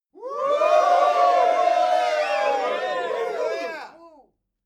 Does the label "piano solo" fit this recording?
No